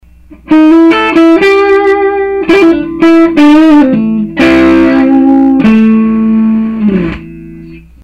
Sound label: clean